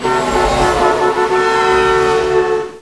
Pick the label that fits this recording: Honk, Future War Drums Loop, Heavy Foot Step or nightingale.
Honk